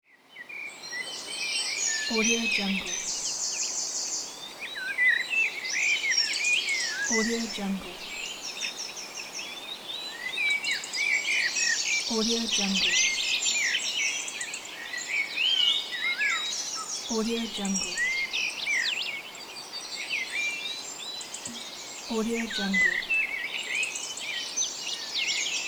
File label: birds